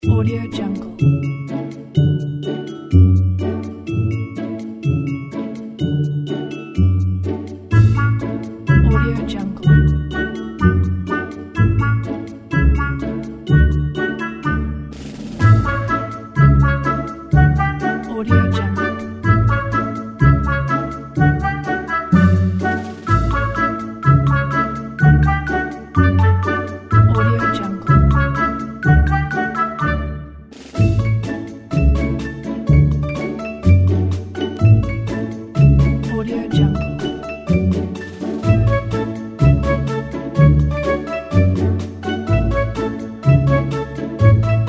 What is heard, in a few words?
amusement comedy track